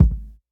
warm kick sound g sharp key, kick drum sound tuned to the G# note, Loudest frequency: 119H